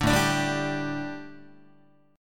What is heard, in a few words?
A 7th Suspended 4th down Guitar, chord; A7sus4 strummed